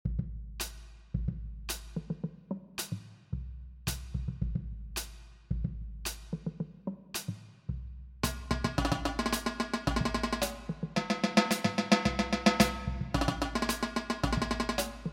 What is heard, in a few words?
groove; Drum Cadence; Percussion; 100th Anniversary Alumni Band